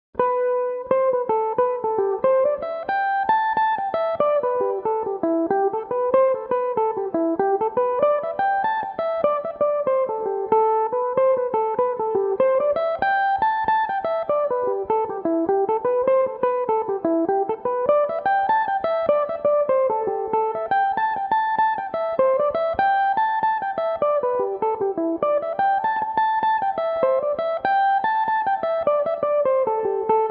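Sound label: A dorian, irish mandolin, tunes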